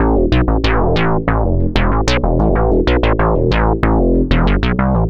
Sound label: Synth Bass